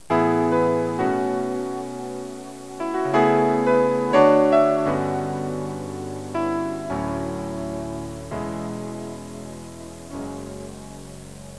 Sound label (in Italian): musica newage